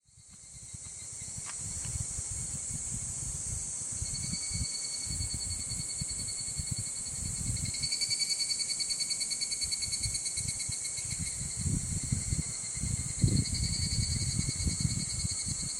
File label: cicada song, Japan's summer